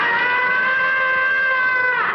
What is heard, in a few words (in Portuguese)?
grito